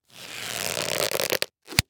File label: Latex Glove Stretch; Medical; Blastwave